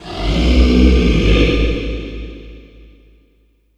LION BREAT, MONDO LION; Spectrasonic Distorted Reality 1

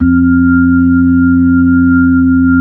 B-3, B-3 Brite; Xfade; Keyboards of The 60's and 70's

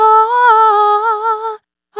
Compulsion Dry, Vocal Samples, Loops; Sonic Foundry (Sony Creative Software) - Pocket Diva World Class Vocal Samples; Op-Erratic Loops

